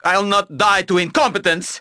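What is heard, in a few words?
dying; nwnds audio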